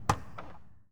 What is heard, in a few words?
Ferrari 355 Door Open, Automobiles